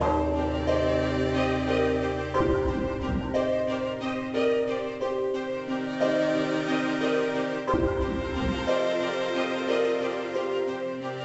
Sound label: Ambient; The Sorcerer's Apprentice; NDS